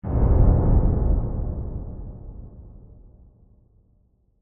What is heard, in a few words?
Hit Reverb Dark; Hit Sound Effects; Sound Design